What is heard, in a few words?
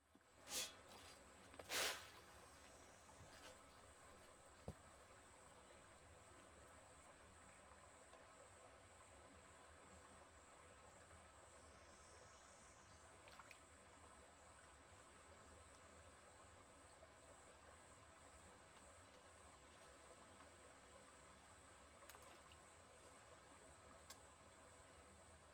fish feeding DirAC 5 1